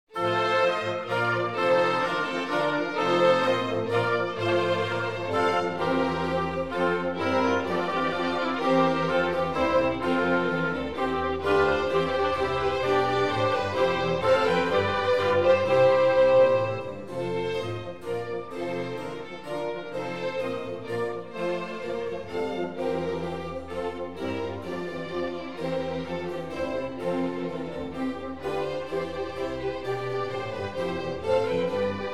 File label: Concerto in F Major, Menueto; Concerto No 1; BWV1046; Bach; Bach The Complete Brandenburg Concertos